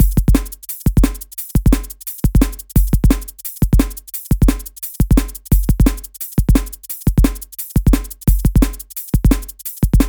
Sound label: Drumloop